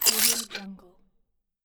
mechanical scifi interface transition

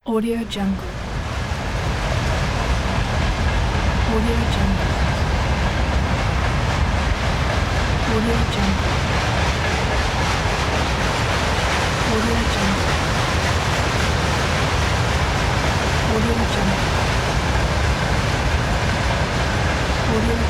great train; aseptic sounds

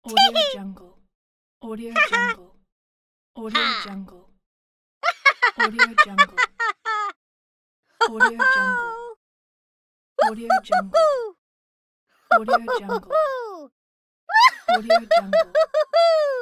cute cartoon character laughter

